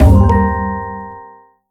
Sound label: UI Multiplayer Match Start; hero defense haunted island